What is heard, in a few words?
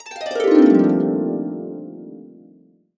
BB MAJ, Harps; USB Soundscan vol.62; AKAI